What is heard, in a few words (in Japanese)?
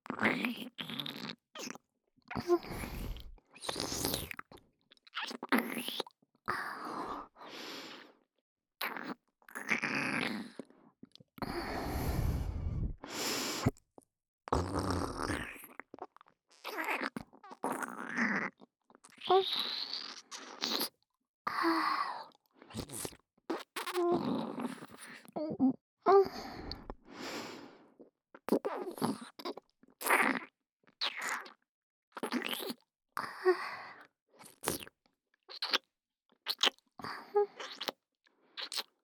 耳フェラ 左耳 ねっとり耳フェラ